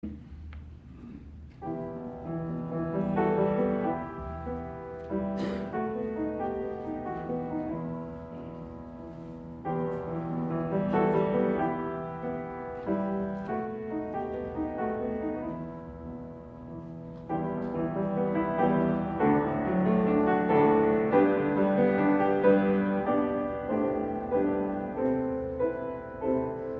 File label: Offertory